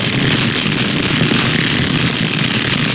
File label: shockwave projectile loop